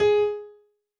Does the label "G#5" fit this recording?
Yes